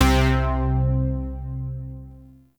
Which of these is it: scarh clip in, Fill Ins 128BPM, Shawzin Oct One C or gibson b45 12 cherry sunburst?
Shawzin Oct One C